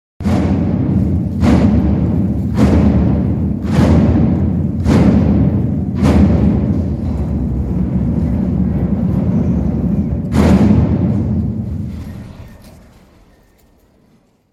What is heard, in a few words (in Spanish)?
Tambores, hermandad penitencial, Semana Santa en Zamora; Siete Palabras